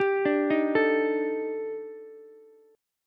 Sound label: D sharp Major 7th Flat 5th, down, Ukulele; arpeggiated; JGuitar